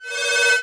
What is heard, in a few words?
Science Fiction Sci-Fi Electronic Scanner